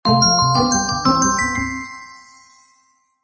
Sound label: cute happy victory